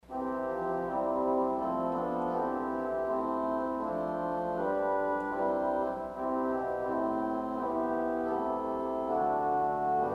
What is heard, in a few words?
Cor D'amour, organ stops